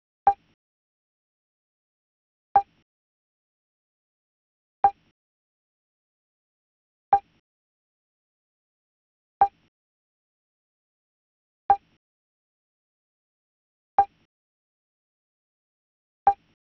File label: Drum Loop, 105 BPM Percussion, Latin; Loop Stems; Cymatics; GHOST (Beta Pack); Crisp Drum Loop